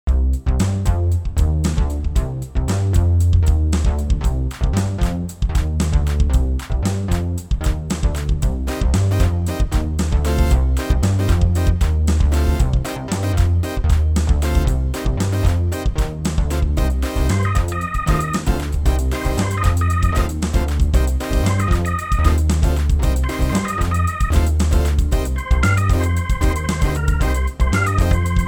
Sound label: MIDI ditty; spellman studio